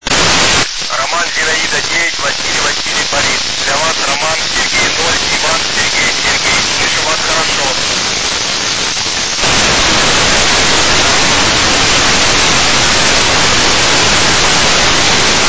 145mhz800 fm; iss

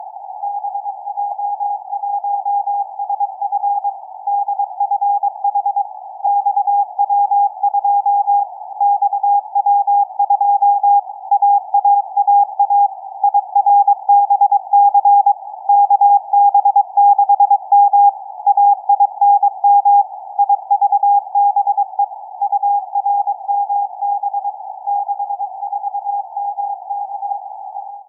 145mhz910 cw; XW2E CAS3E VERT

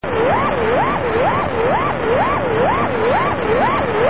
relay

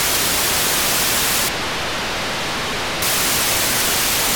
437 MHz 9k6 FSK beacon, satellite; Painani-1; 437MHz475; On October 3rd 2022 at 11:21 UTC Daniel DL7NDR received on 437.475 MHz the 9600bd FSK beac

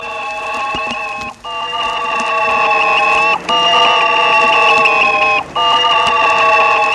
Explorer 1 sound; terra in ascolto; This / recording of Explorer 1 is from vinyl no. 4 which was / included in the Italian Enciclopaedia L’uomo e lo spazio

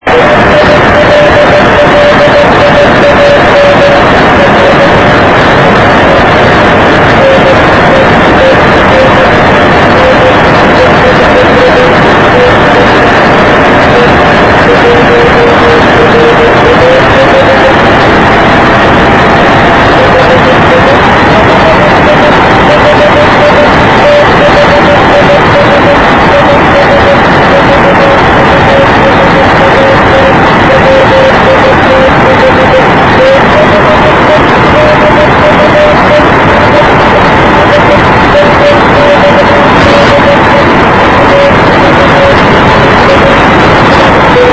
cw beacon; vo52; The CW beacon of the Durch transponder. transmits on 145.860 MHz +/- doppler. Recorded on Nov 18th 2005 at 19:38 UTC by Matthias DD1US

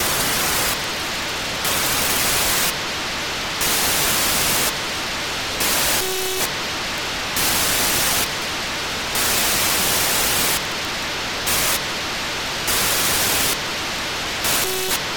437 MHz 9k6 GFSK beacon, satellite; OPS-SAT; 437MHz200; On January 25th 2023 at 17:35 UTC Daniel DL7NDR received on 437.200 MHz the 9600bd GFSK be

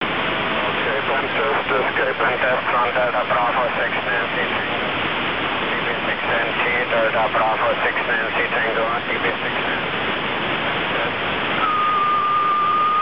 ao40 24ghz; SSB on the 24 GHz downlink while he was using the L-band uplink

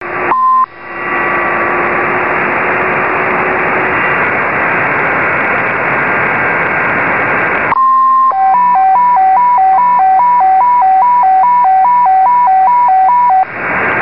test of leila in cw; LEILA function in CW conducted by OH2AUE during pre-launch tests; AO40

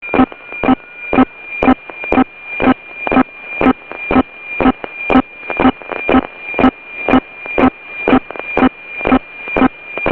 signal; resurs o1 n4; RESURS O1-N4 is a Russian natural resources sensing satellite that was launched from Baykonur using a Zenit 2 rocket

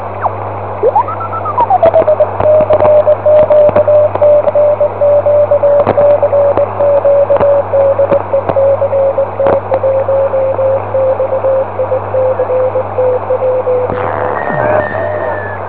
cw CQ; ao-6

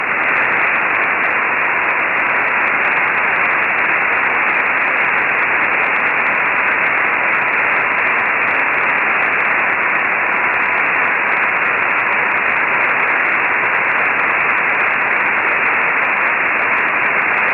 gmsk beacon 145mhz641; xw-2a

